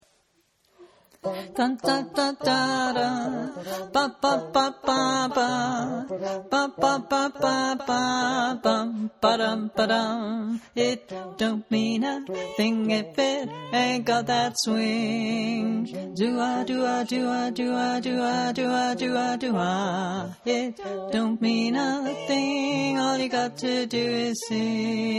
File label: Swing with slow ending tenor